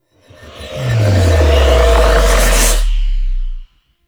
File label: Riser; Stalker Try; Warframe; Jade Shadows; Quests; Transference Birth Cinematics